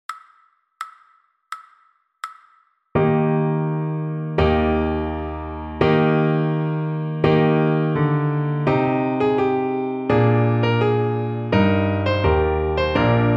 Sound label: piano slow; Trumpet Tune